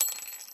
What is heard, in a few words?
Small Light Metal Ring Falling And Hit Ground